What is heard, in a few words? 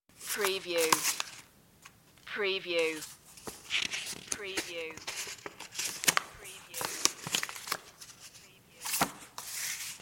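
Shuffling Index Cards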